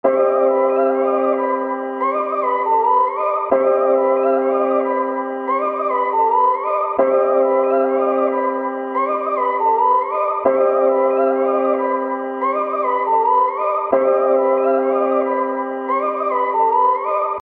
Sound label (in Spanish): melodia